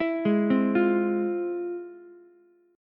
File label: F Minor Major 7th, Ukulele, chord; FmM7 arpeggiated; JGuitar